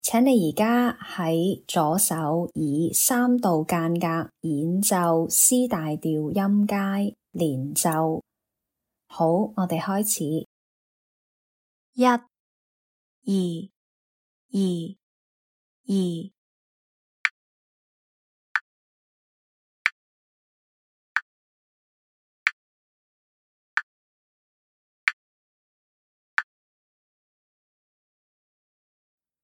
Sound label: c maj legato scale in 3rds lh